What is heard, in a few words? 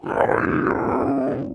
Zombie; Blind Survival